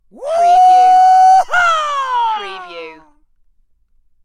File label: Rebel Yell